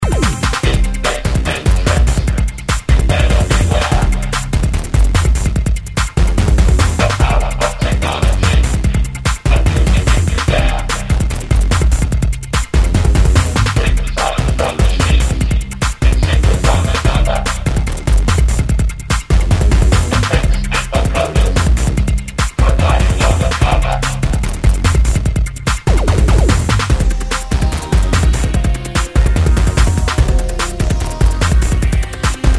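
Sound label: Deep Bass Matrix vocal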